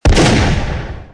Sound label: explosion; merge-dragons-2